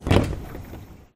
train door open

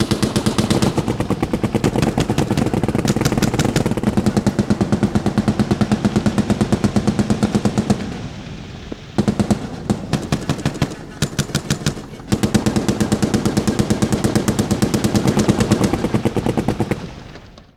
machine gun firefight

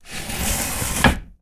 closing sliding screen

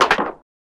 cracking shoulder bones

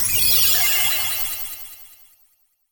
cute pose, anime

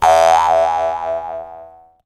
miss, anime

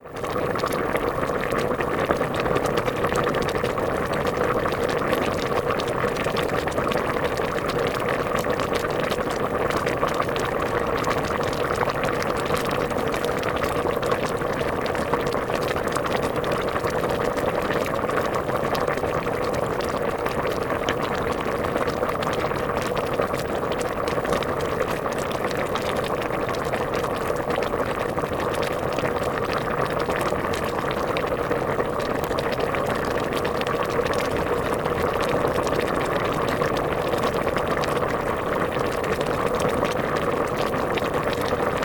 stewing

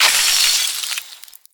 ice magic

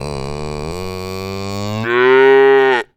holstein cow mooing

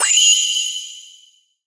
flash of light

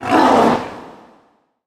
african lion roar

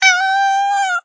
cat meowing

